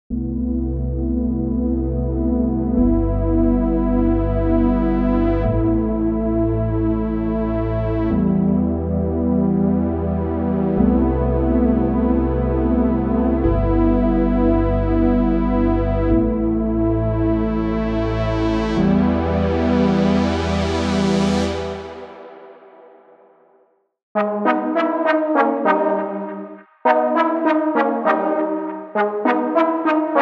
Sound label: Ambient & Downtempo; unisynth